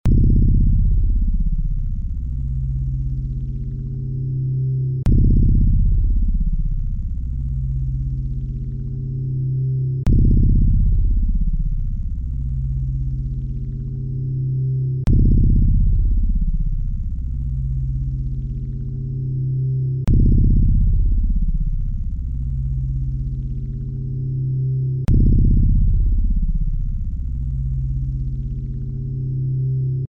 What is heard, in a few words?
music; svencoop; sence; mistake coop